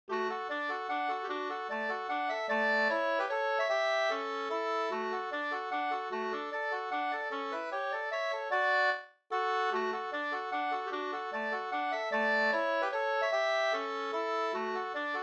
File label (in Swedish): vals Ddur